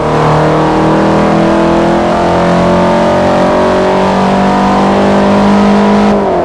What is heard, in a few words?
third, vehicles; gmod; tdmcars; new beetle